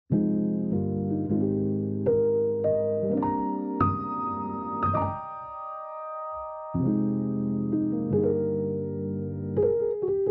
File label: lofi